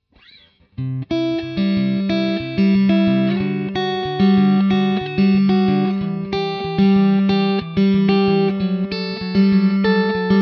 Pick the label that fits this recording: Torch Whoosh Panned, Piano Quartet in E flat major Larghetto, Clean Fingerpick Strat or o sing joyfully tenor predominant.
Clean Fingerpick Strat